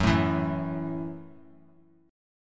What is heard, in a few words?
Gb Minor 7th; strummed; JGuitar